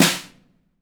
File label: METAL, SNARE